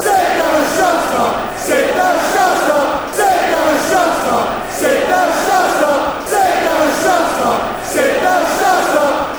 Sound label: sit down shut brighton fanchants